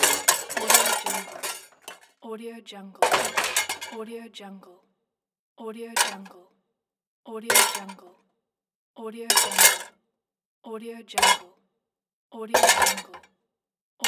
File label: metallic stuff